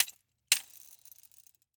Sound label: Roller skates, old fashioned, all metal, wooden wheels, clash, strike, clatter, ping, double, spin; Eiravaein Works; Vaeyan IV